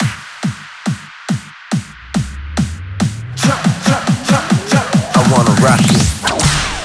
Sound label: Fills, Loops 140 BPM